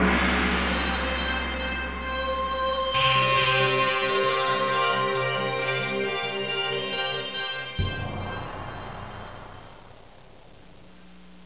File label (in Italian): musica newage